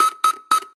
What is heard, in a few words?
Save